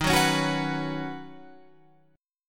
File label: D sharp Minor 7th Sharp 5th D sharp, down, Guitar, Open F; D#m7#5 strummed